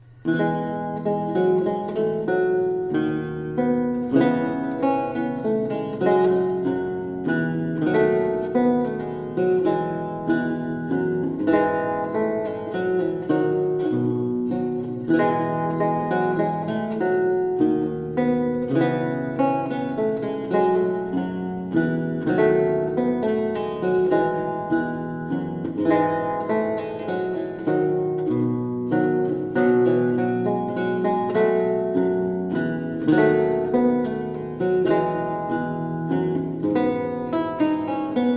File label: menuet